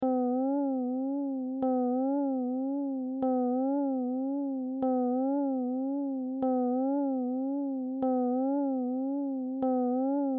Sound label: pitch auto